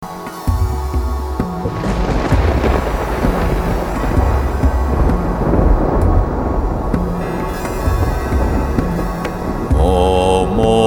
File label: Mantra of Vajrakilaya; buddha within yourself